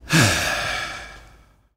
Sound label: sigh; ltt anthony